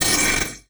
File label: Multimedia Internet CD-Rom Flash Blast